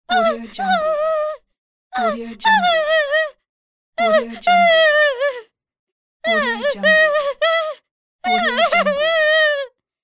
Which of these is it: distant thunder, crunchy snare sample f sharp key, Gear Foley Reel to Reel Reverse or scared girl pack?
scared girl pack